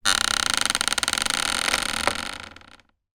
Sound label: office chair creak; zapsplat